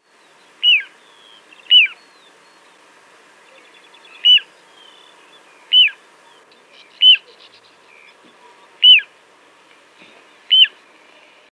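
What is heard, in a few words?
little ringed plover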